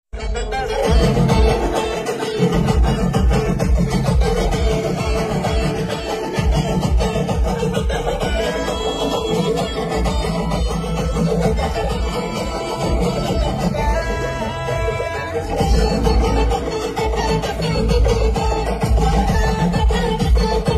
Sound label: song ringtone, bgm; kalki; prabhas